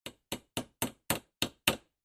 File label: hammer hammering